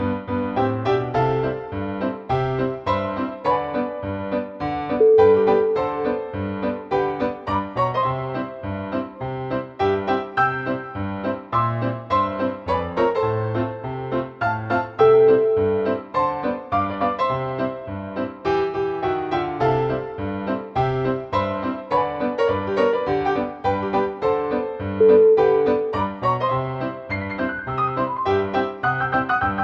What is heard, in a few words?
happy birthday rag